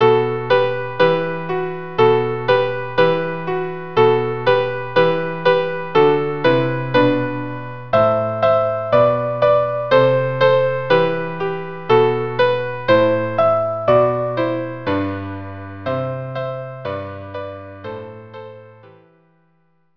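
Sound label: lullaby violin piano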